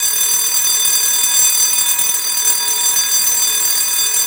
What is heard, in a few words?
alarm buzzer; Barotrauma; barotrauma ek; Electricity